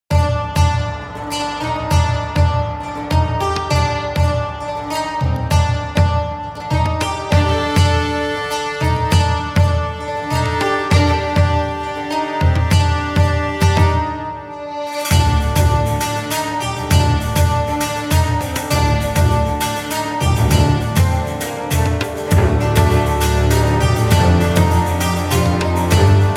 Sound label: Fantasy Strings Laute